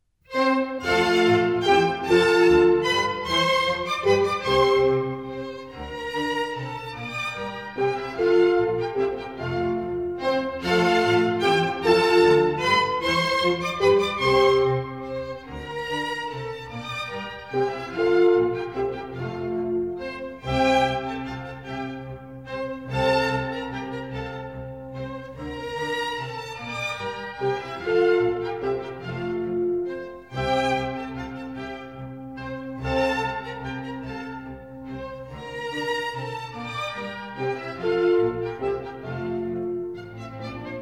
Minuets, F Major; Mozart; Dances and Marches

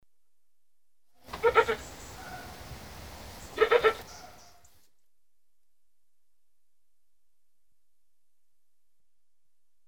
Goats Billy Calling Nanny, Livestock; BBC Sound Effects Library

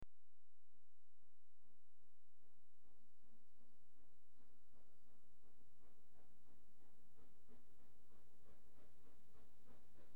Distant Train Starts From Station; BBC Sound Effects Library; Age Of Steam

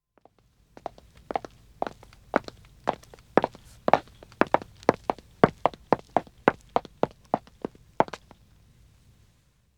Wood (Dead Acc) Walking 2 wom, Footsteps; The BBC Sound Effects Library

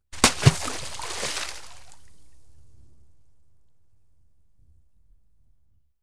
Splash- smaller, Water; The BBC Sound Effects Library

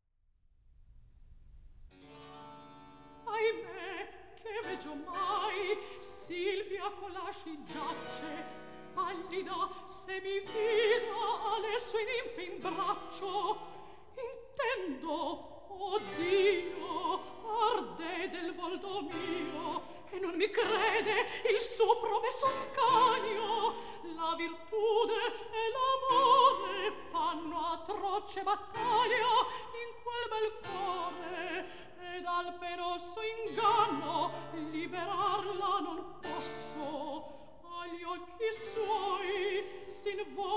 Recitativo; Mozart; Ascanio in Alba; Ahime Che veggio mai

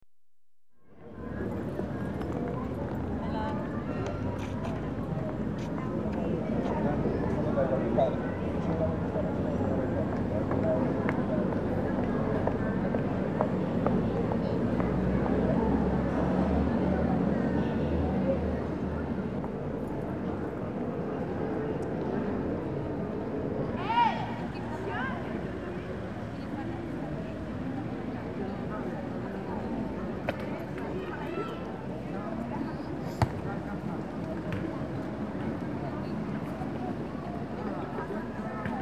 General Atmosphere In Small Squar, Spain; The BBC Sound Effects Library

